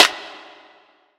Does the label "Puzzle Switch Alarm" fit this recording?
No